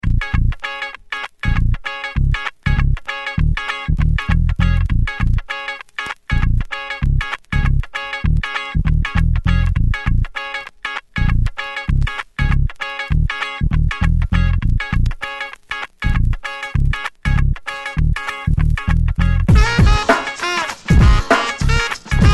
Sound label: Saxy Thang; Fat Jazzy Grooves 5